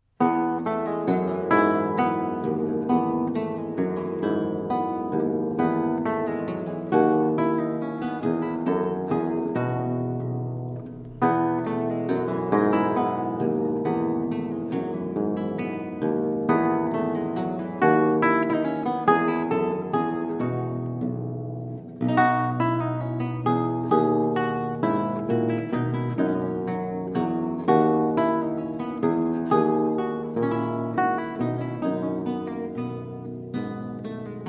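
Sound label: Menuet in F Major, Lute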